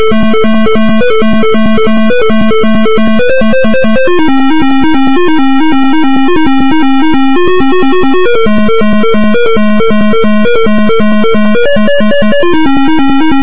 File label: Techno'd; freebuild music